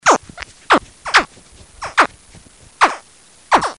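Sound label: alligator yapping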